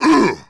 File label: Torturer Dmg